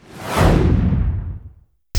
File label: Whoosh Thick; Turbo Snake